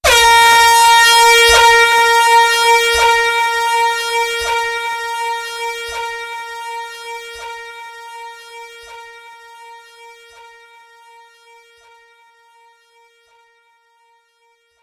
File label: AIR HORN